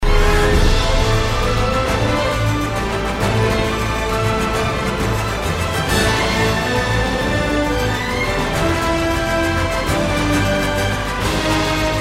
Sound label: Solo